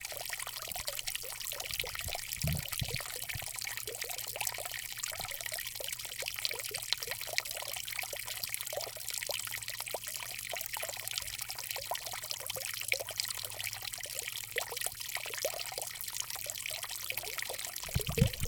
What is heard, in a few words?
fountain